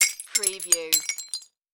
Debris Tile Clay Drop

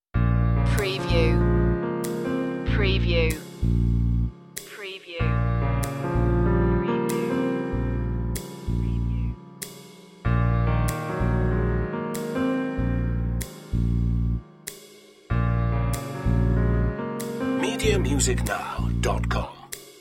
piano slow swing

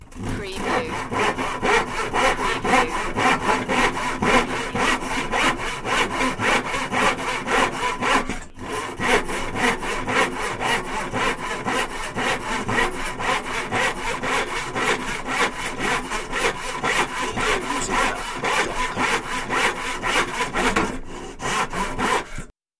Handsaw

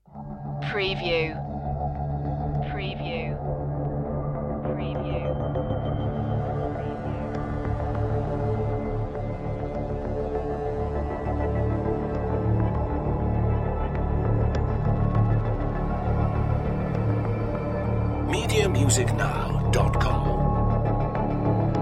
creepy hollow

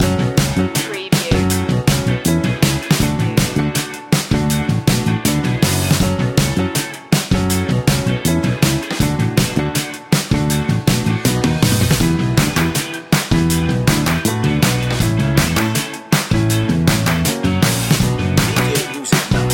funky hop

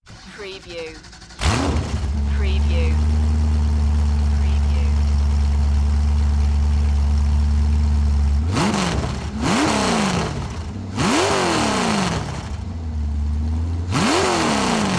Chevy V8 Hot Rod Engine Start Rev Shut off

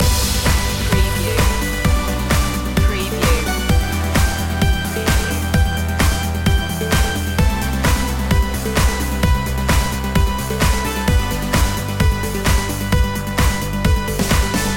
positive energy loop

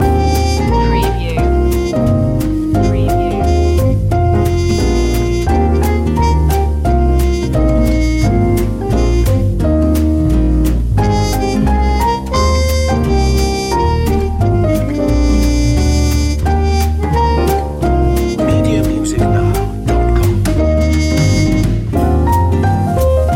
in the bleak midwinter jazzy long loop

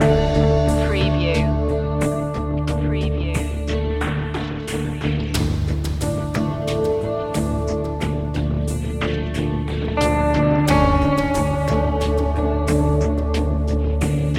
sweltering heat loop

